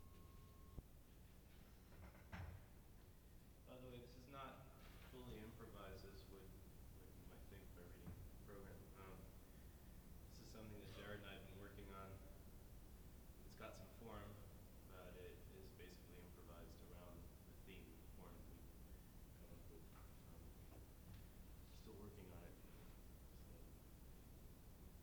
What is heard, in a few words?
A Duet for Piano and Percussion